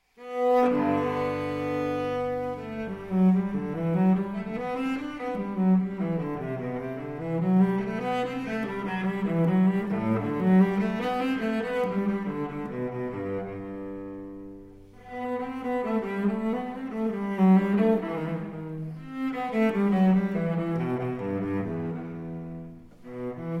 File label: Cello Suite in G Major, Allemande; Cello Suite No. 1; BWV 1007 II.